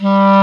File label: clarinet G3; East West; Global Sounds